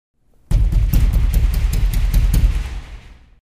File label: door knock